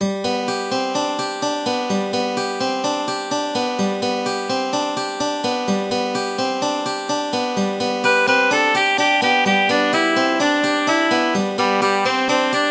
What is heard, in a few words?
Acoustic